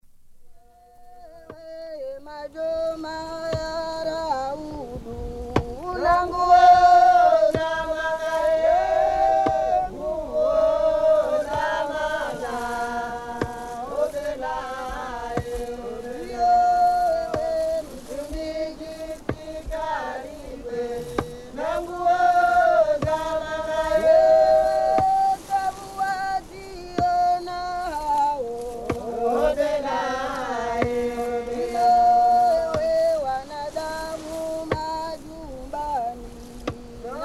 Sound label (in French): chant